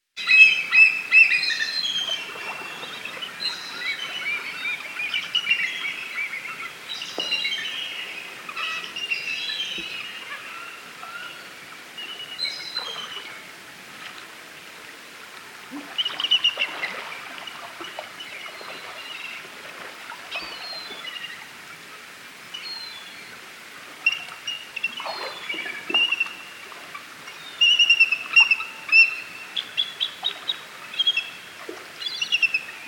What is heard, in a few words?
Birds Amb Lake Side